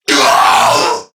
Death Crewmen; Warframe; CorpusCrewmen; CrewmanA

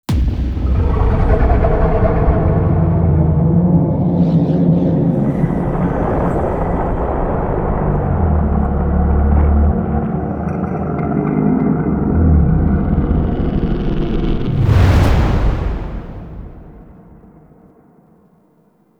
Deep Space Devourer; Warframe; The New War; New War Stage Two; Cinematics

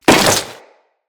Crewman Step Flesh, Footsteps; Corpus

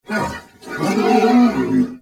Horse Trot Breath

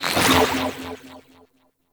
Menu Open; Gameplay; Conquest; Warframe; Nineteeen Ninety Nine; Deep Archimedia

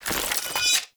Bayonet Reload; Warframe; Tenno

